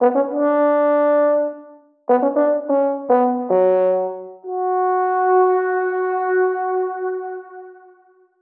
Conch Horn; Legend of Zelda The Link's Awakening

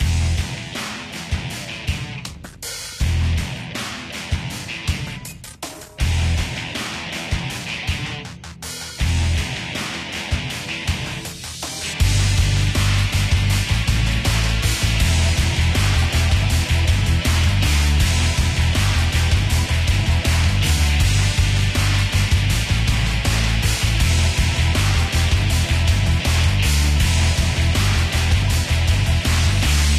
Heavy Music; android

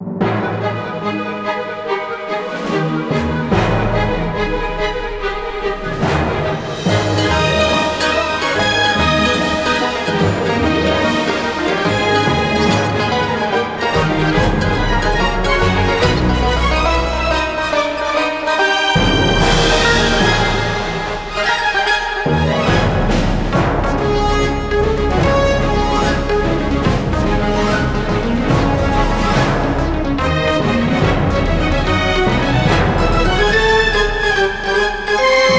Underwater Boss; Frogger Beyond; GC PS2 Windows Xbox

